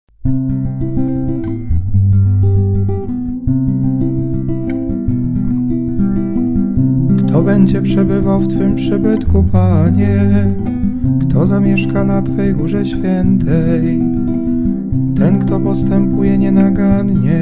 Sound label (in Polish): Melodie psalmów jutrzni